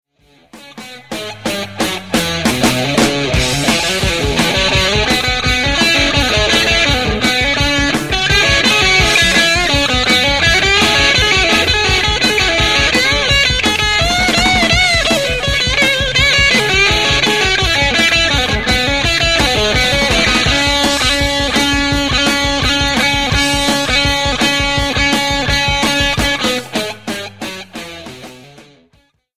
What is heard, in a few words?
solo, guitar